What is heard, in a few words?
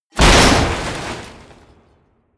smash, baugewerbe